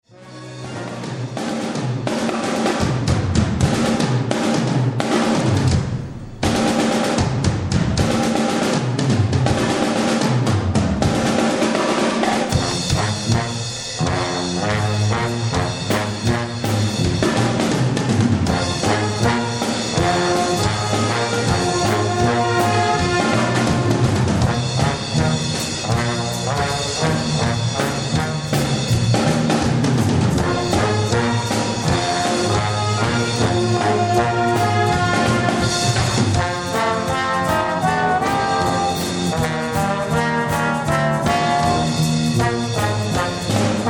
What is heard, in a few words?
blues